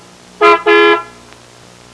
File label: BEEP BEE